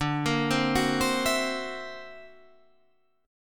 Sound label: D Augmented 9th down, guitar